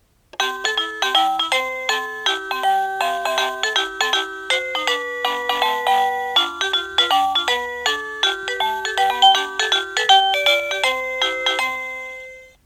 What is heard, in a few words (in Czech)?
melodie